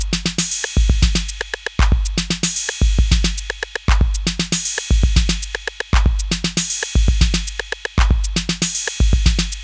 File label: Sweet Drums